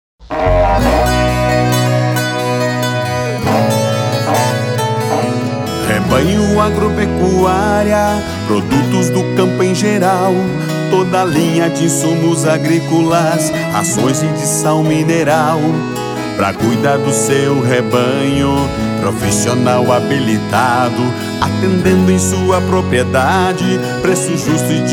Jingle Rebanho Agropecuária

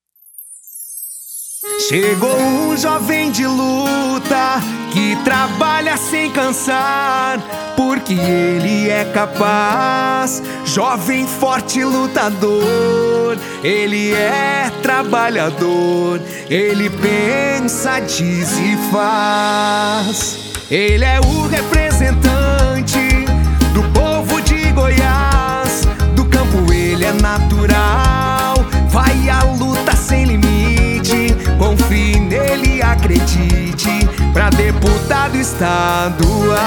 Jingle; Gil Bezerra 27127; GOIAS; RENOVACAO

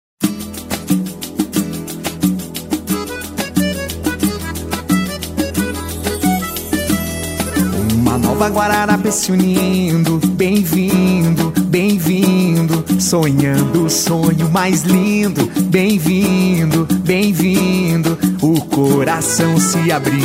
Jingle PREFEITA; Bem Vindo; Guararapes

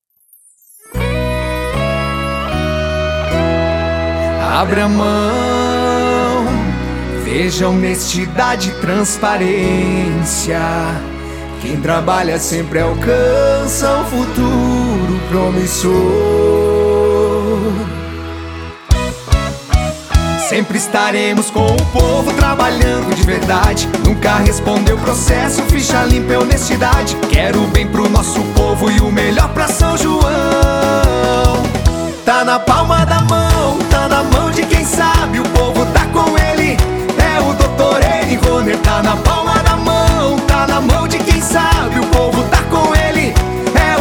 Jingle PREFEITO; Dr Nroner; RENOVACAO

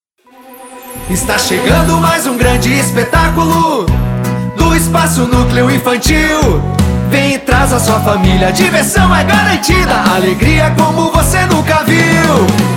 Jingle Espetaculo; Espaco Nucleo

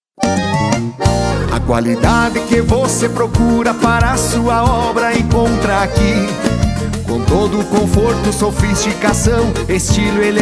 Jingle Vidros e Esquadrias; Conglas

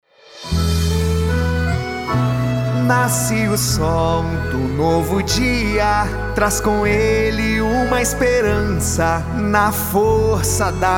Jingle VEREADOR; RENOVACAO

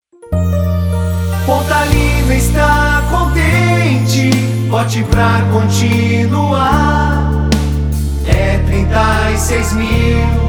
Jingle Inedito Vote com Sabedoria VEREADOR; Pontalina

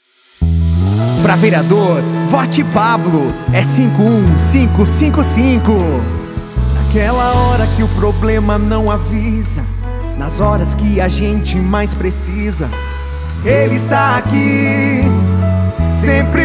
Jingle VEREADOR; Pra fazer acontecer; RENOVACAO

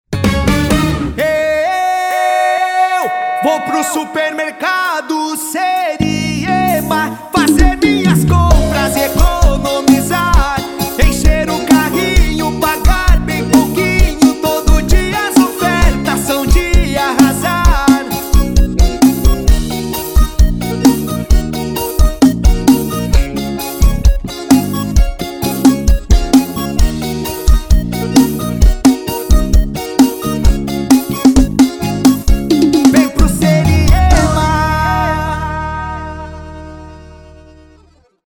Jingle Supermercado; Seriema

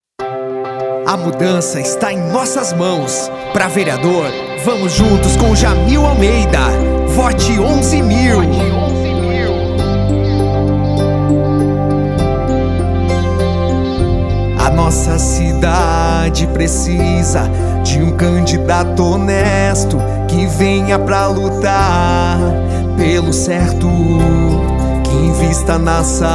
Jingle VEREADOR; RENOVACAO

